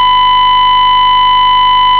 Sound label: 1kHz; eletronica; sinais eletricos